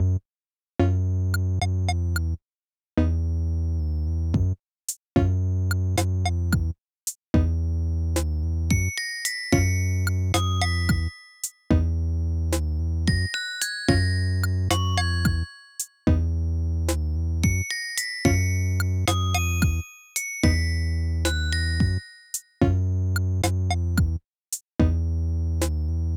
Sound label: Forest Life Aircheck Retro